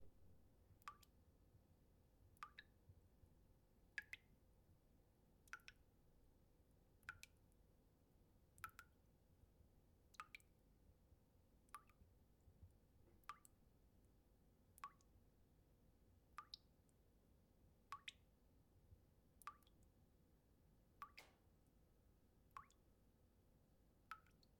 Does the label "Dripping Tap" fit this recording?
Yes